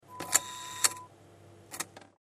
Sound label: Polaroid